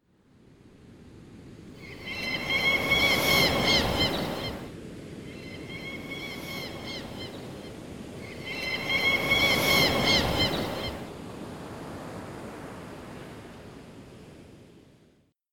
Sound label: sea sounds